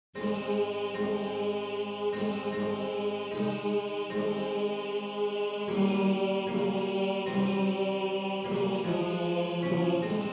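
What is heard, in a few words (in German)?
Tenor 2, Männerchor; Wohin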